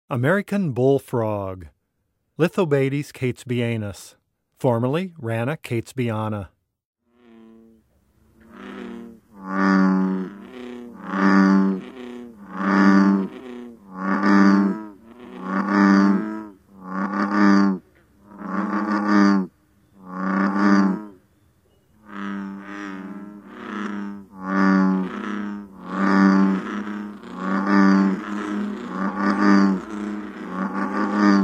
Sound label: American Bullfrog Call